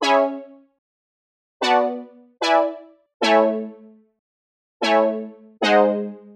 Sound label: Stab C# 150; Melody; Keyboards